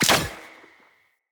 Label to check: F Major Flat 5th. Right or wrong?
Wrong